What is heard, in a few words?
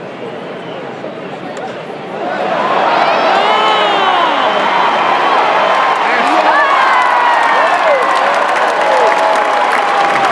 Homerun